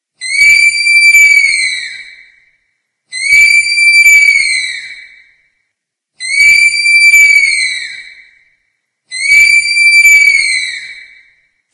som de bicho preguica

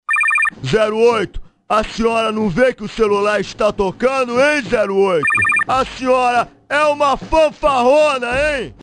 fanfarrona; toques para celular; liga para celular

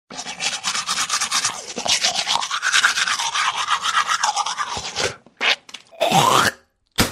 escovando os dentes; efeito sonoro

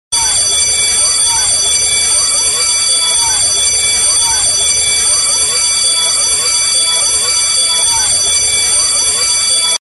toque da escola; toques para celular

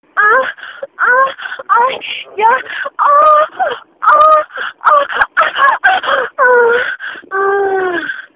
toque orgasmo, som de gemido de mulher

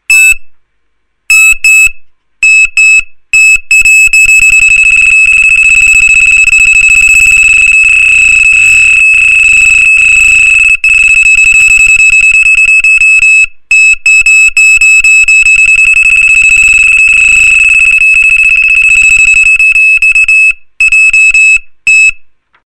alarme agudo, irritante; toques para celular

